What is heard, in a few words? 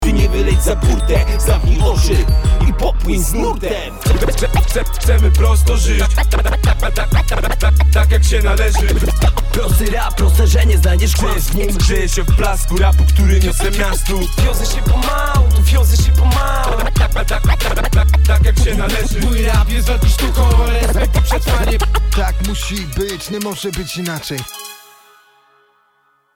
Scratch Cut Outro